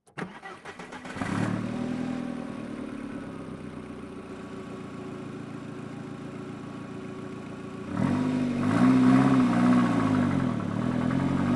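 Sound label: toyota endurance race car onboard slow start drive stop reverse stop drive stop engine; mix; Sonniss GDC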